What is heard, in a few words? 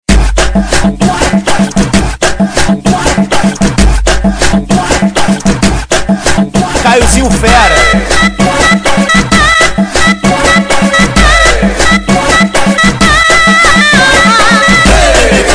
funk